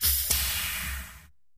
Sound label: Hydraulic Release, pneumatic